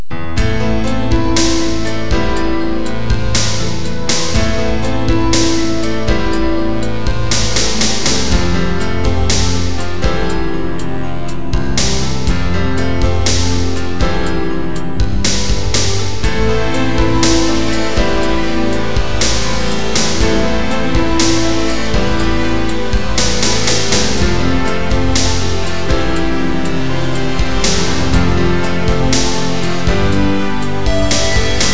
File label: mourning